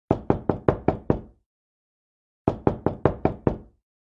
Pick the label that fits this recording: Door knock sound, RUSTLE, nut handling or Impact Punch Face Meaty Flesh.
Door knock sound